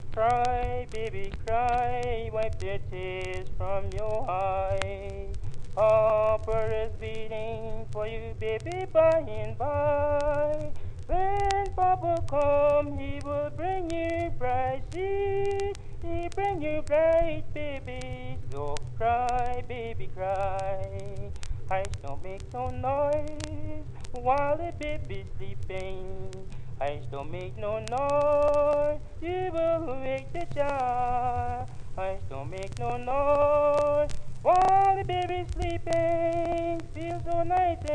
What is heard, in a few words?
Lullaby